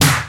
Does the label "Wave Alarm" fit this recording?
No